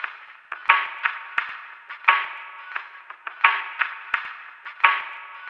LoFi Funk 087, Lounge Drumz

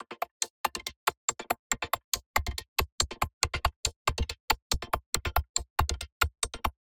Perc Loop

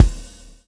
SWNG

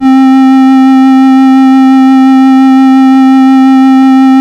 Casio VL1 Flute; Giga Samples Collection

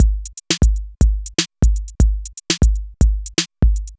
Synth Loop, BPM120

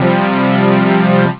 saw sus4; jazz jackrabbit 2; M8

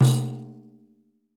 PANDEIRO

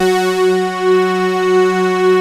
JUNO 106, PADS; Masterbits; Vintage Voltage

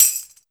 TAMBRIN, TAMBOURIN; Big Beat Samples & More; AKAI

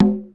9000 LO CON, LINN 9000; Hollywood Edge; The Big Bad Beatmachine

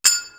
FING CYMB 3; East West; Global Sounds

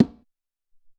Tumba

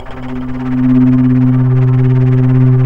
FLT, Bass Flute, Bs Flutter

